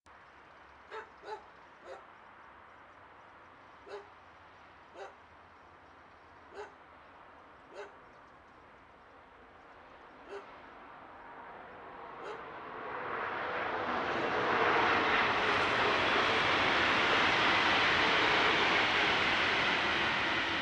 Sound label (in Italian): Treno mono; Eigenmike; A-weighted; Nuova Strada